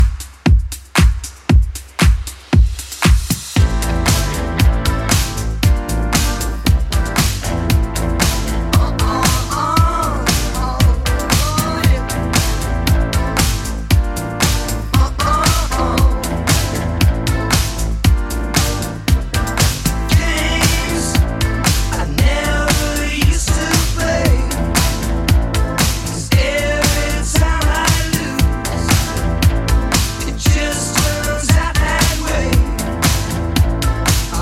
80s Club Edit 116bpm

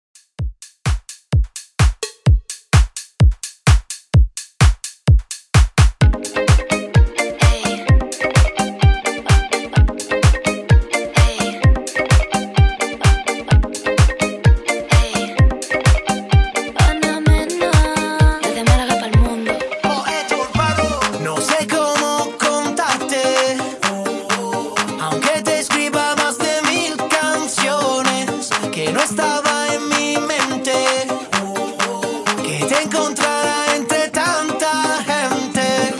Latin, 128Bpm

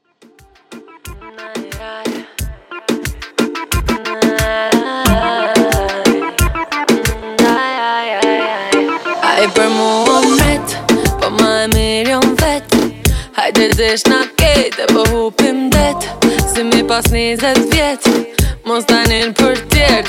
Reggaeton 90Bpm

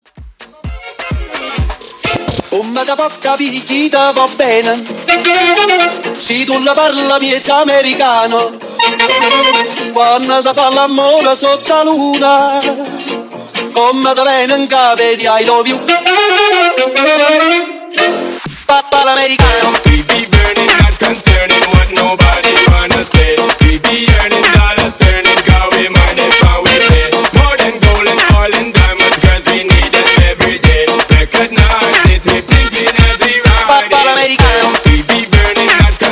Hype In Outro Rewind Afro Tribal 128

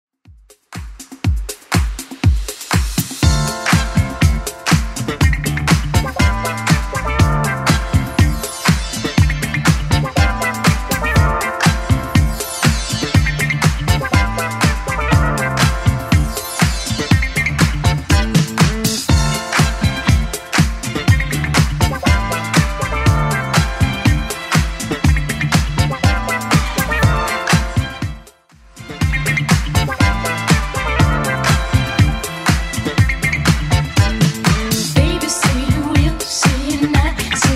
80s club 121 bpm clean

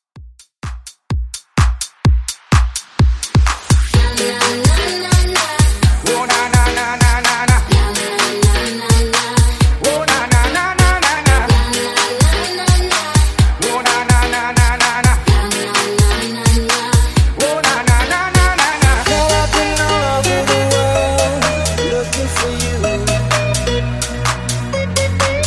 Mashup Redrum 127

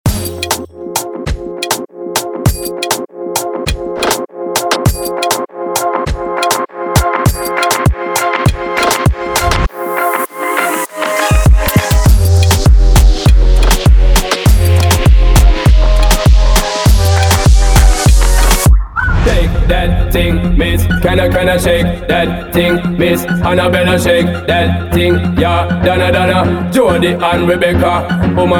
Acapella

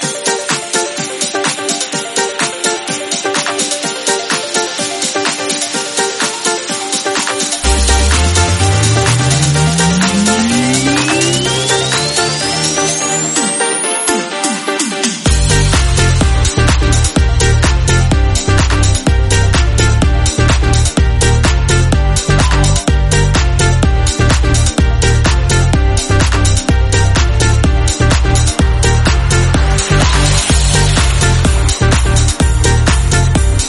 126 bpm Gm

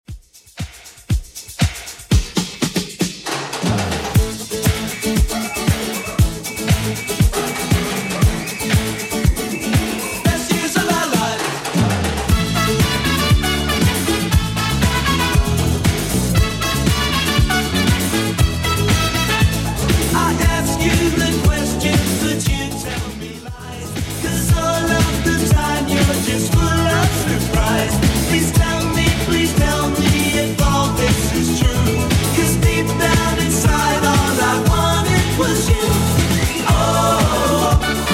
80s Redrum 118bpm

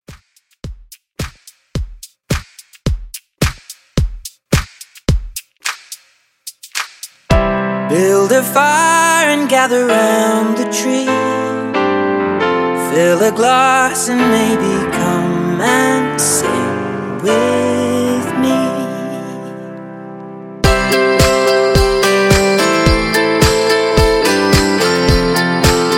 Christmas, 108 Bpm

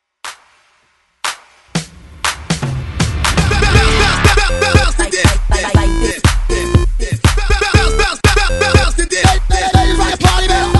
Party Breaks 120 Bpm; DJDOLLS

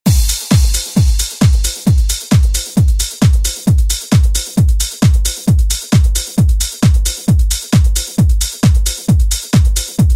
90s

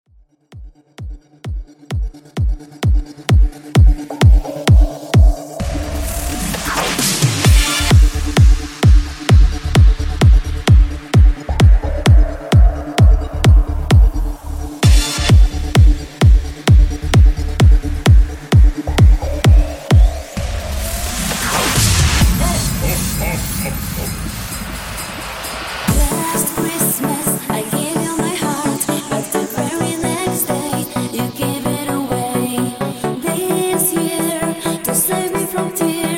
Xmas Big Room Banger

